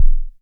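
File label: kick, Moog Minimoog Drums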